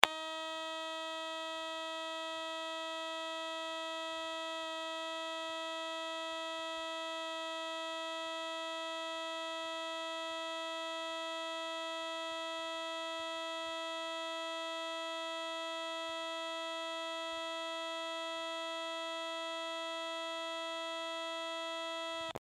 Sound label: Cb Radio Feedback, Cb Radio On Feedback Whine Off; Technology; Miscellaneous Electronic